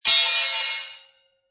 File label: Metal Drop On Concrete And Ring, Impact, Metal Dropped On Concrete, Crash Ringing After Impact